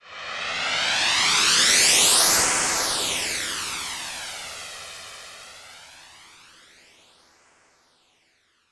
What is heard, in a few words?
Uplifter